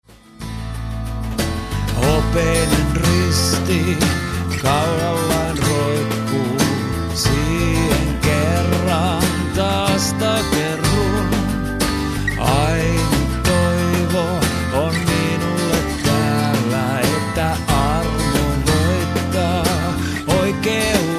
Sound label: unplugged